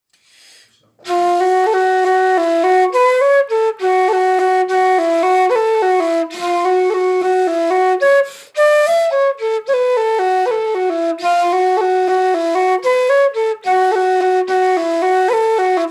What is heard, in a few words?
Slip jig Variation F sharp minor